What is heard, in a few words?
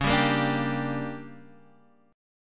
D Major 7th Suspended 4th Sharp 5th, chord